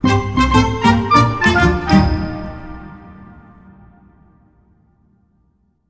victory; pullparty; Heroes of the Storm

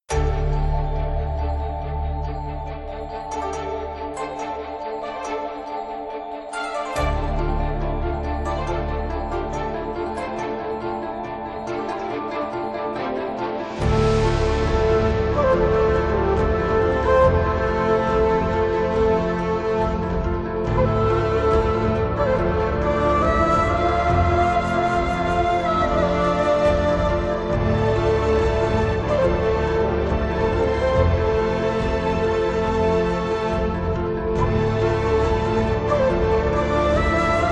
Chinese Mythology (Chinese); The Ultimate Minecraft Console Music Pack 2020

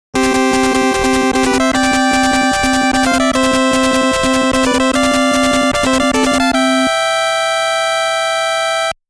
All Stage Clear

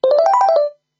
Se Heal; Kairobotica

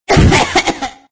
Fem Choke; Extra Lives

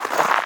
snow wading; Scrat

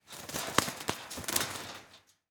bird in cage shake wings rattle

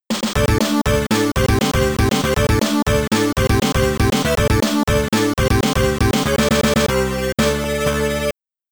Boss Beaten; Teenage Mutant Ninja Turtles; Amiga

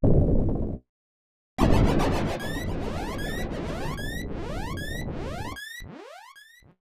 Mysterious Crash; Earthbound; snes

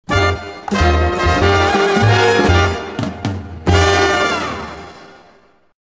BGM Sting Complete

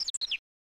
Birds

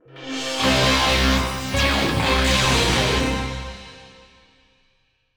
Stinger

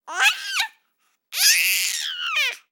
baby 5 months laugh; a dump in the dark

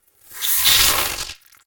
Flying Insects attack

